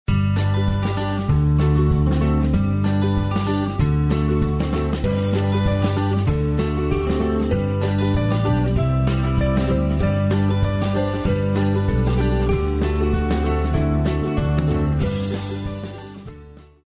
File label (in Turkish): santral fon muzikleri